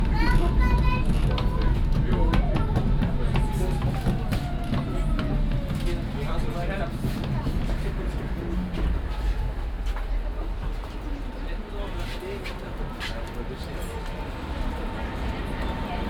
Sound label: S Bahn Berlin; Sound Scapes